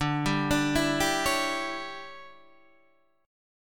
D Major 7th Suspended 2nd Suspended 4th D down Guitar Open D